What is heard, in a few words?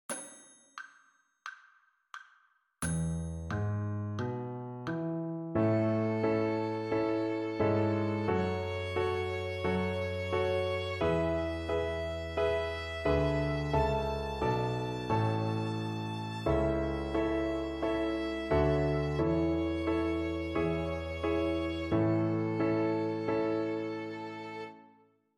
A major arpeggio